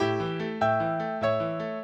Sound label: minuet, mozart